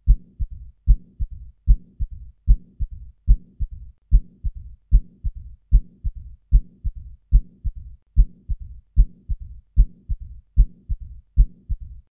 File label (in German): Mitralsstenose und Mitralinsuffizienz, Heartbeat